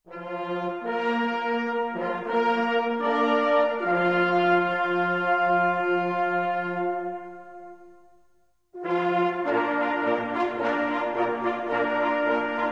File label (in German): Lied - Auf auf zum fröhlichen Jagen, Jagdhornblasen; Brauchtum